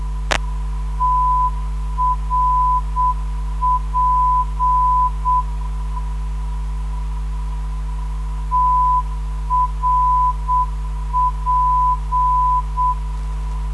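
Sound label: TRP 317.5kHz, non-directional beacon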